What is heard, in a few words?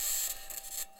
mic, mars2020 edlcam